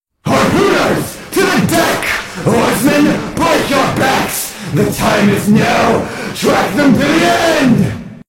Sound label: harpoon; gas